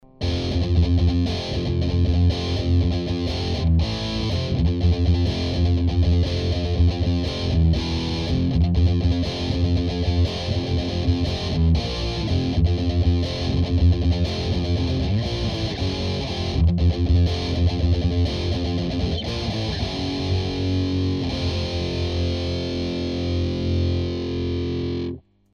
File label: Distortion Scooped Guitar